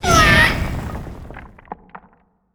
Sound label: Lithoid portrait spined; Stellaris